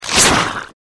arm swing; npc; sporecarrier

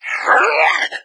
sight, hazmat zombie; doom3

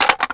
clip out

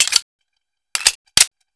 p38 world reload; weapons

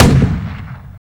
mortar; weapons; diprip

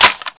clipin, weapons; weapons SVD

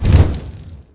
fireball throw

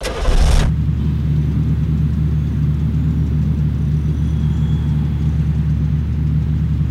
simulated vehicles idle

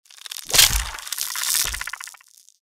mirelurk attack power; npc

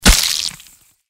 sting attack; npc; cazadore

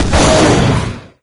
ceiling attack, wraith; doom3

